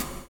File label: SHUFFLE; Univers Sons